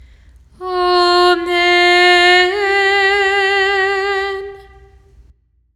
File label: Amen reference track